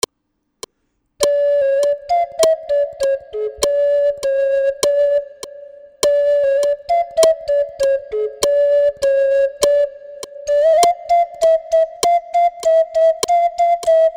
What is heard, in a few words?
Fum Fum Fum Flute